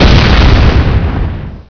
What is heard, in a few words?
explode